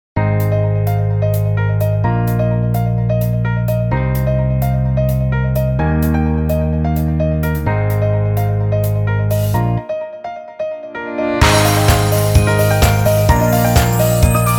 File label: BGM